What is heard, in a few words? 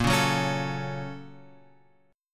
A sharp Minor Major 7th Sharp 5th; A#mM7#5 Chord {6 4 4 6 X 5} strummed